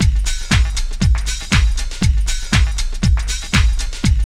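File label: drum loop; X-Static Goldmine 3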